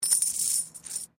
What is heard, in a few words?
Coin Penny Multiple Move Stone; Common coins; Money; Objects